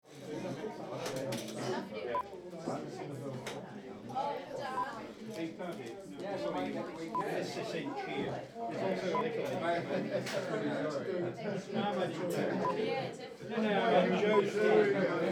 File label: English Country Pub; Hawkley Inn